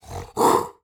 Animal Mammal Carnivore Cat Wildcat Hiss; animals; AdobeCC

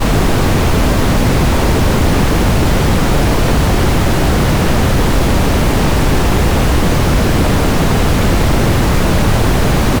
brown noise

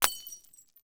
Crash Glass Break Thick Glass And Martini Glass; Crashes

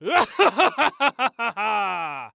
Horror Scary Human Male Evil Laugh

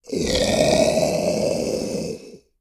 Animal Mammal Carnivore Cat Lion Hiss; animals; AdobeCC

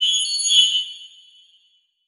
Title Transition Crystal Glow; Adobe; Production Element

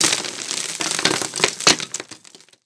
Crash Wood Debris; crashes; AdobeCC

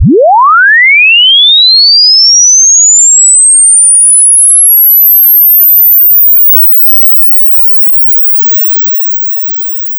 sine sweep linear; Tones

